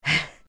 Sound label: Huff; Link huffing when low on health; Adult Link